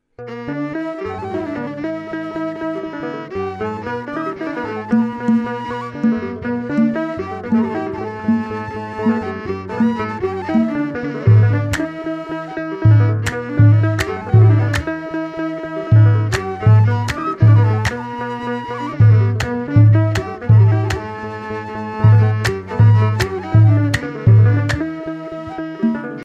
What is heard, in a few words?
tizitaw clacical, Ethiopia; mezemure; World Music; AFRICA